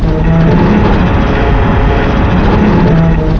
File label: Destroyed Int